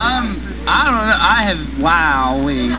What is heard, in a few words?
wowee